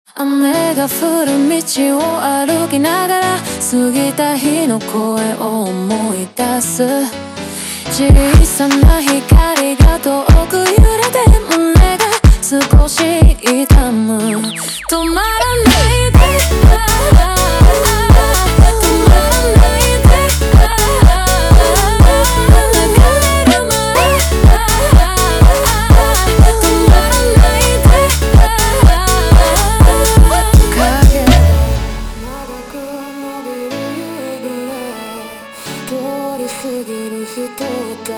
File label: Montagem slowed